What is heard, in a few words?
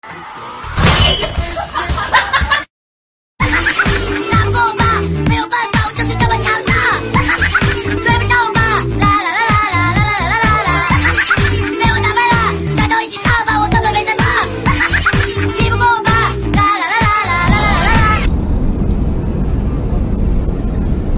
laugh; breadradio